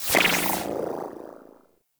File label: Rank Up; Warframe; UI; Conclave Cape